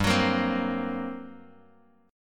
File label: G Minor Major 11th, Bass Standard; GmM11 strummed; JGuitar